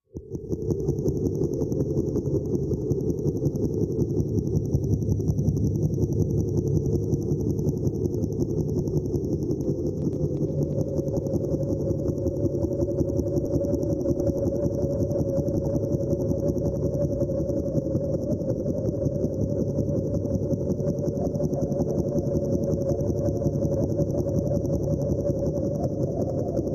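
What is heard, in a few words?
Cutter Wind Machine Engine, eerie ambience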